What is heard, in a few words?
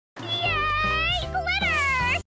yay glitter